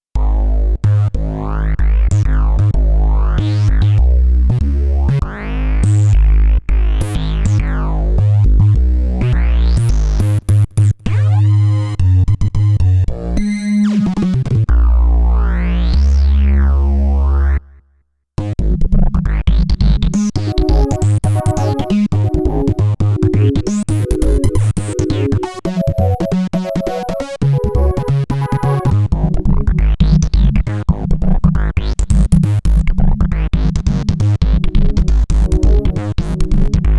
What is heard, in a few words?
Radiator Bass, Korg Radias-R